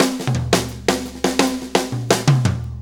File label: 4-4 Fill, Drum Loops